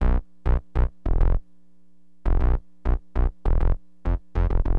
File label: SYN BAS, Acid Jazz Funk; Bass